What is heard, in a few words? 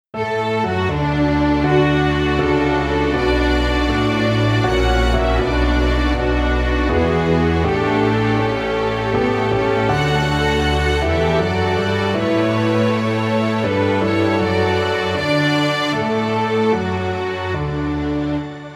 Strings